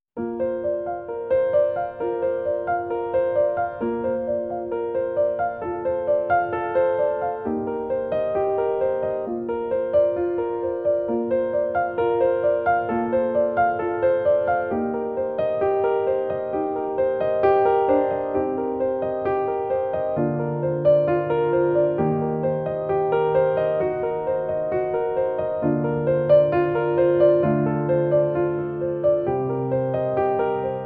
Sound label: Music Only